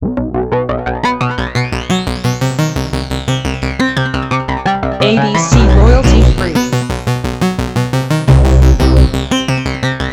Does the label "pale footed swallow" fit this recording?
No